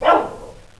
BARK

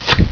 AROW PAIN; MISSILES; GOBLIN

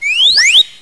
WHISTLE, CARTOON

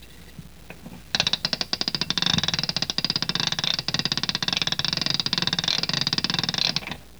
CLICKITY

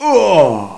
pain; JOSEPHIN

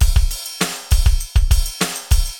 ROCKS, ROCK POP DRUM; MAGIX

